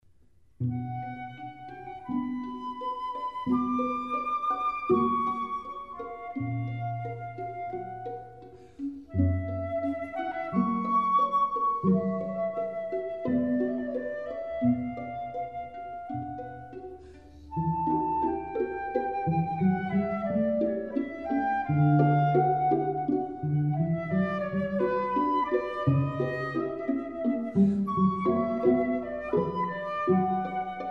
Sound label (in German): Flötenquartett; Mozart